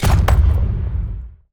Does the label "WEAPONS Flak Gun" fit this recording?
Yes